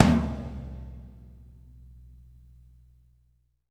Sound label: TOM 2J R; TOMS; Spectrasonics; Burning Grooves; BG TOMS